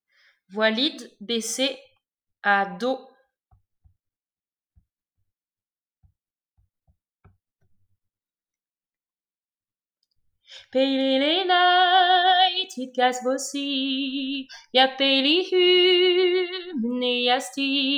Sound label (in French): Voix lead